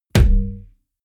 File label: heavy bass saturated hollow, hits impacts